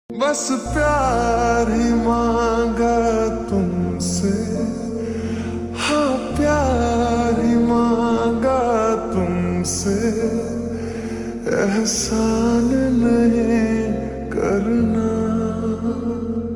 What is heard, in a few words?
Ringtone Slowed Reverb